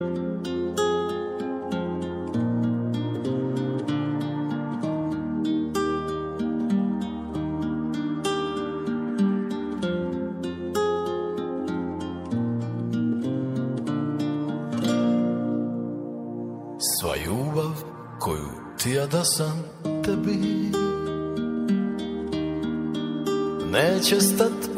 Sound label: romantic croatian